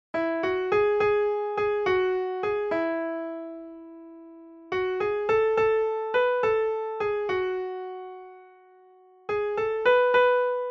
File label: solo, midi